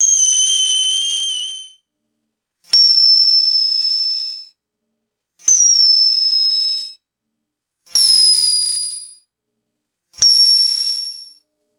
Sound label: retro anime metallic ping accents; cartoon